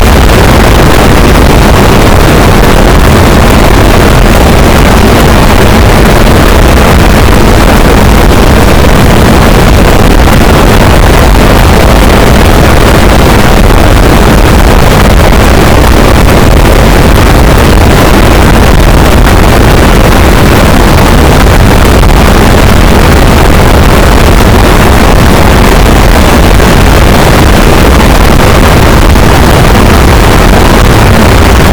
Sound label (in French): cachalot negatif, boat, Physeter Macrocephalus